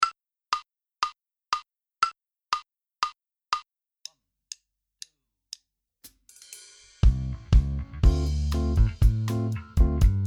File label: Minus Guitar; Inst Ensemble